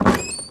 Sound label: Large Chest Open